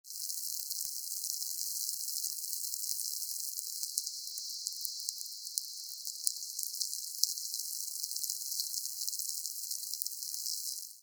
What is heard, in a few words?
Shrimp timelapse